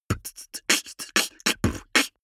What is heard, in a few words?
beatbox sequence damped